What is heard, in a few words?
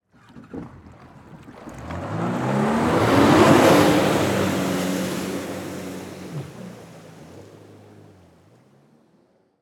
Jet Ski Away; Blastwave FX